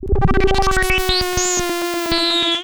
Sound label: Roland C Noise, Roland S100